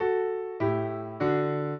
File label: minuet, piano; Mozart